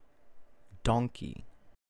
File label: Donkey